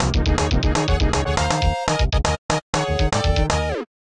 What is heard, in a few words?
Unknown Jingle; MSP